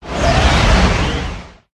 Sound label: cat block